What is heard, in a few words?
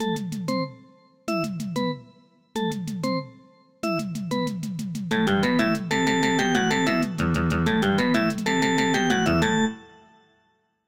ringtone moving